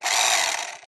motor small coarse rev short revs high toy car rc; Skyclad Sound

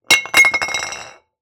Wine Bottle Empty Knock Over Concrete

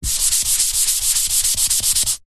office chalkboard eraser duster rub

